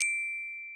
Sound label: gloken